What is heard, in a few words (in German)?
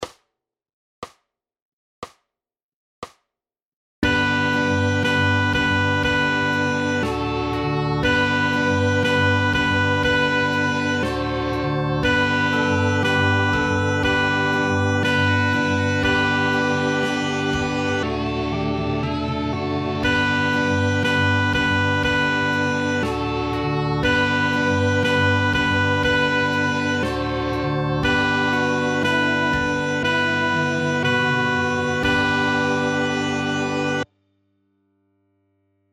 first song saxofon